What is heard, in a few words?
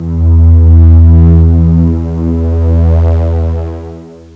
space